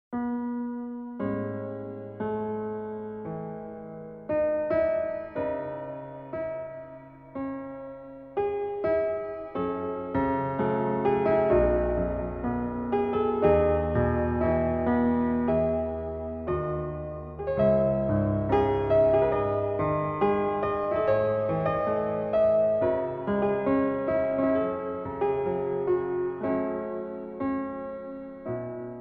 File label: piano only ver.; Sword Art Online Complete Music Collection; SAO OST Vol 2